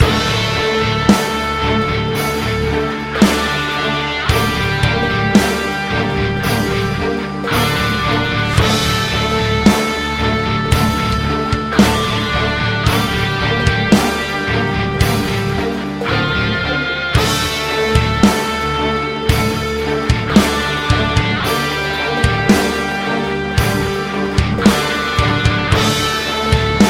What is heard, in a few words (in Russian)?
muzyka dlya igr